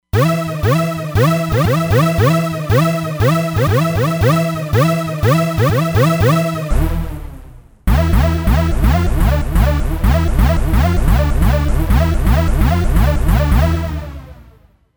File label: Alpha Hoover, Roland Fantom XR